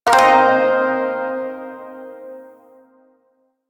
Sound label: Shawzin Blues Chord